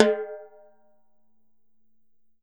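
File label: TIMBALE, Salsa Kit; E-MU Producer Series Vol. 7 – Old World Instruments; Drums&Percussion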